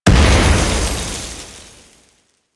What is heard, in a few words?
Grenade Explosion; Kahl Veil Breaker; Warframe; Quests; Kahl Abilities